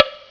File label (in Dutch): TOK; Zoemtonen hisses pingen woesjes tikjes